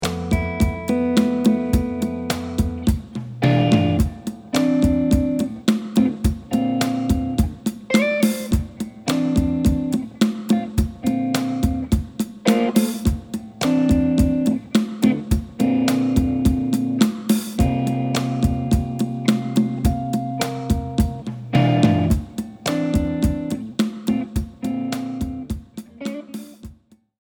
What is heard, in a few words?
Jazzy Studio, Duoverb